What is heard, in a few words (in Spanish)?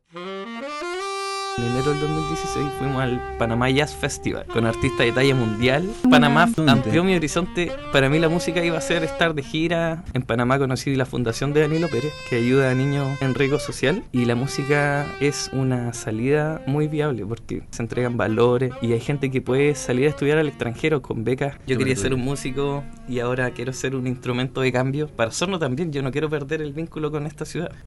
SAXOFON